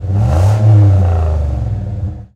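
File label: Truck Cherokee Rev; SFX Kit; Trucks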